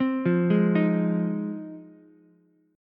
G sharp 6th, Ukulele; arpeggiated; JGuitar

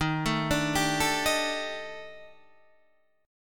Eb Major 7th Flat 5th, Guitar Open D; down

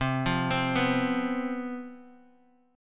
C Major 7th Suspended 4th, down, Guitar, DADGAD

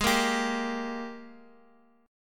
Ab Major 7th Suspended 2nd, Guitar, Open F; AbM7sus2; strummed; chordshape; x,x,x,3,1,2; JGuitar

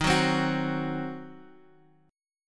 Eb Minor 6th, down, Guitar, Half Step Down

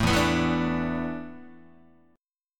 E 7th Suspended 4th, Guitar; E7sus4 strummed; 0,0,2,2,1,1; JGuitar

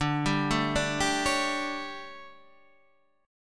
D Major 7th Suspended 4th, Guitar, Standard; DM7sus4; arpeggiated; JGuitar

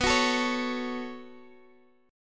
B 7th Suspended 2nd B down Guitar Standard; B7sus2 strummed; chord shape; JGuitar

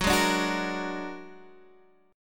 Bb Augmented 7th Gb

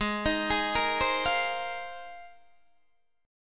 Ab 6th Add 9th Ab, Guitar, DADGAD

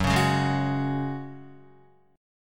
Gb Suspended 2nd Gb Guitar; Gbsus2 strummed; 1,4,1,1,1,2; Gbsus2 chord

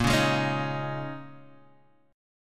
Bb Minor 11th Bb down Guitar Half Step Down

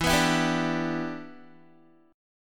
E Minor 7th E down Guitar; strummed; 4E,4A,5D,5G,5C,6F; Em7

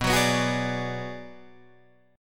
B Minor 6th B Guitar Drop D, chord

